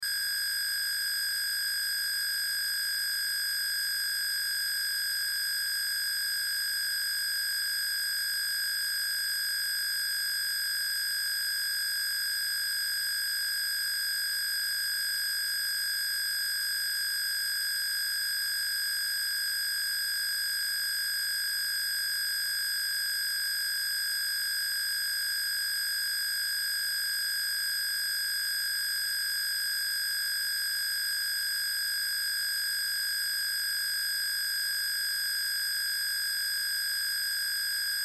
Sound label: Sci Fi Laser Beam Ray Stream; Lasers